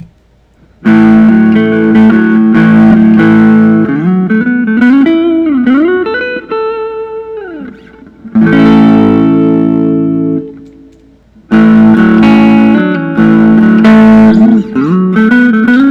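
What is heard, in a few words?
collings i 35 deluxe all mahogany; vintage whit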